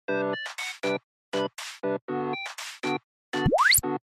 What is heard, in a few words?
loop